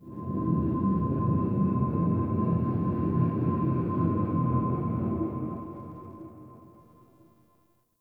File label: Crp Ship Energy Low Texture Large, Corpus Ship Ambience